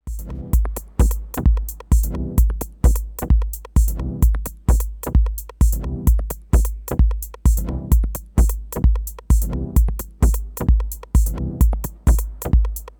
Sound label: Juno6 Noise Pad 2; Rossum EM Control Forge